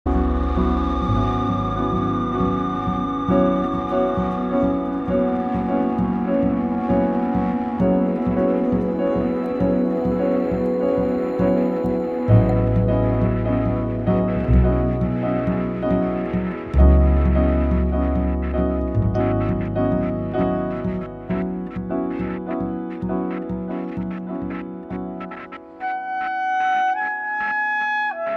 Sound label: piano